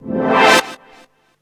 synth

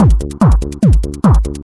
tech 145

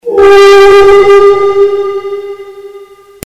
Undwat

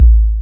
Bdrum

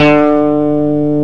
60s Rock Guitar, Clean guitar D3

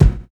kick

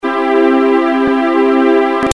Chord

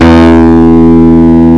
Rock Guitar Light OD gtr E2

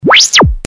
Zap

